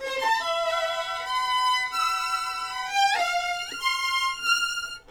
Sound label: violins